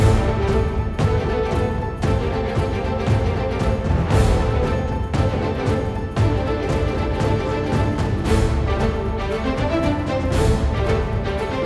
bgmusic battle

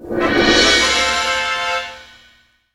Quest Completed

